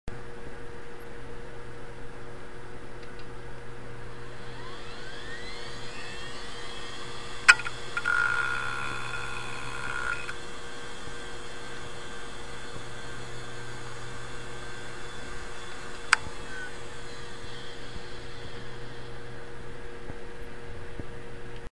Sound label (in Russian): Zvuki izdavaemye polnostyu ispravnym vinchesterom IBM